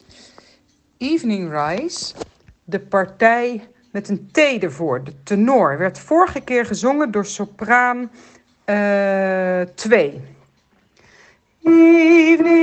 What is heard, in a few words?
evening rise